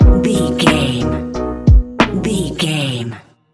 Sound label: electronic stinger, A relaxed and chilling piece of music